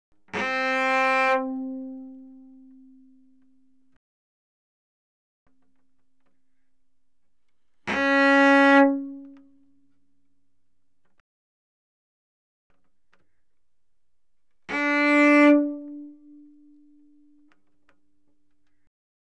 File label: Cello arco ff sul D C4Bb4; Strings